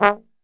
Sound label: G#1, Brass Super Section, TRMB SHOT; Best Service; 90 s Sample CDs